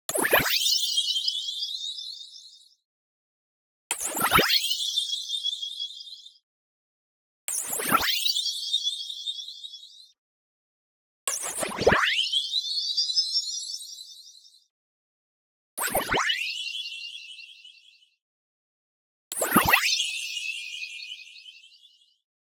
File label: zap, fluctuating shing echo; boom library; Anime Essentials; DSGNMisc